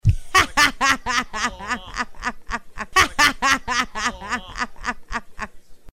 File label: Laugh